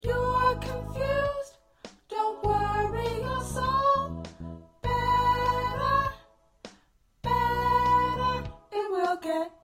Soprano, choir

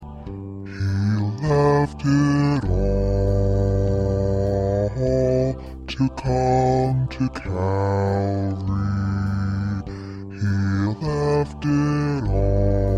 Bass

